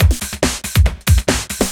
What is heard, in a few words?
Breakbeat; Vengeance Samples; VEC2 Loops